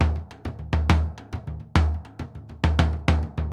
Bombo, Festa Latina, Drums & Percussion; Best Service; Festa Latina VOL-1

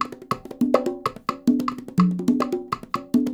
144, Percussion works, LOOPS; Sampleheads; NYC Percussionworks; 90 s Sample CDs

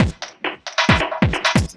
LOOP, Drum & Bass, 135 BPM; Ueberschall; Drum & Bass Flash Trackz

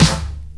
SD, dance drums hip

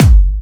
Electro Kicks

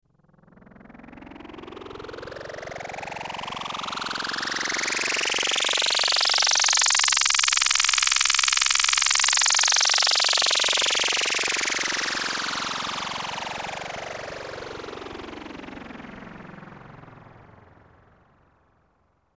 VCS3 FX, EMS VCS-3; Drums

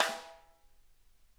rim hi L, snare; Sonic Reality; Interactive Drum Kits

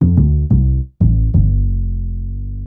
Upright Synth Bass, Drum & Bass; Fluid Dynamics

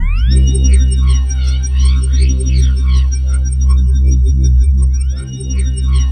C2, SynthLine; 90 s Sample CDs; NiceBeats; SynthLine VOL-5

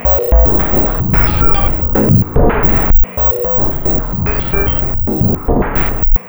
WAVE SEQ; RYTHME; USB Soundscan vol.33; AKAI; Disjointed Textures

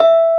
dream box E3, music box; AKAI S6000 CD-ROM - Volume 1

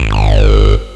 Flange Bass; Urban Shakedown

